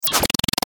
Glitch Squeak Clicks, Glitch Error Distortion; Production Element Imaging Element Accent Transition; Blastwave

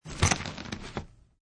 Bag

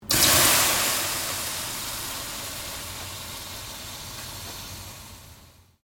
Water Sizzling Very Hot Pan; Heated water sounds; Kitchen; Sound Effects:Household

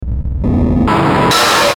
Noise Hiss Static Ascend Tonal; Strange Tone; Blastwave

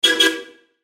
Trolley Horn Beep Double; Transportation; Train Sound Effects; Blastwave

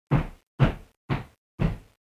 Footsteps On Wooden Floor

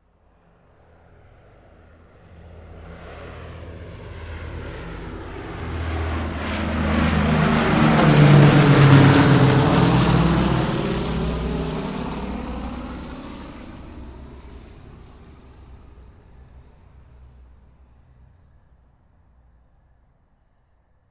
Vehicle Airplane B-17 Bomber Pass By Military; Airplanes

